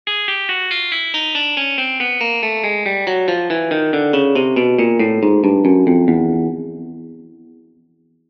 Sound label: chromatic